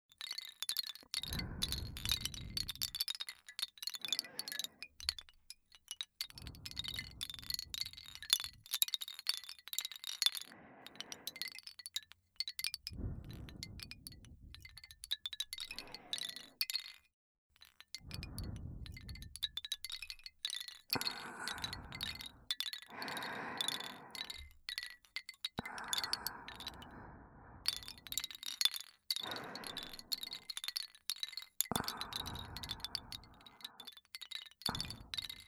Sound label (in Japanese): 木製の風鈴 吐息, ASMR